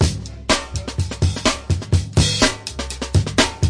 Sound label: 125, DRUM LOOPS and BREAKS; 121 to 130 bpm